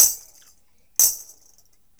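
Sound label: TAMB, tambourine; Drumdrops In Dub VOL-1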